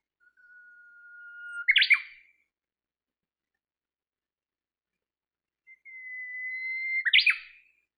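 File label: Japanese bush warbler song, birds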